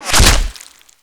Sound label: zombie pain; basebuilder